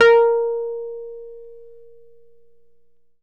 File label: E PNO A#3 FF; Synclavier Sampler Library; KEYS AND GUITARS